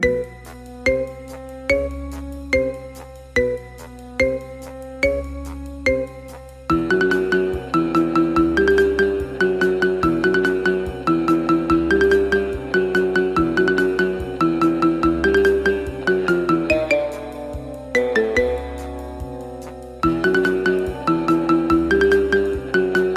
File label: Marimba, ringetone